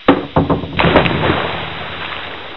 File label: pool dive